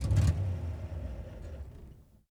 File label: vehicle musclecar turnoff